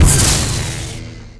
stun gun hit; Tanks

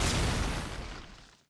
whiplash rebound; Tanks

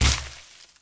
streamers hit; Tanks

